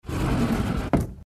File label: intro door slide; Four Beauties